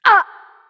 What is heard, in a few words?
Death; paladins; Seris